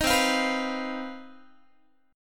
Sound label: C Minor Major 11th, C, Guitar; strummed; CmM11; 18,20,20,21,21,x; 4A,4E,5A,5D,5G#,6C#